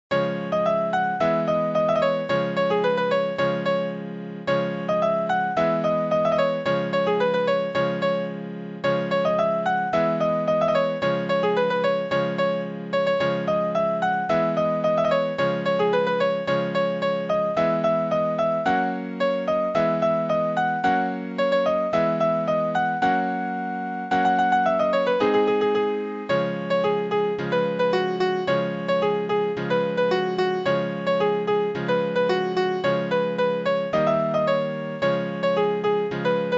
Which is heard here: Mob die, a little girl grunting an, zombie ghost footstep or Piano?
Piano